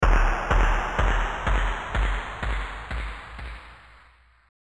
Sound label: Level Failed; Pyramid Runner